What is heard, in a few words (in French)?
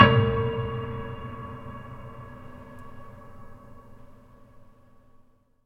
marteau